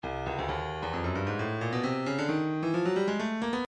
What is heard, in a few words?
breakbeat midi, 133 bpm, drum n base; Stylus; drummundbase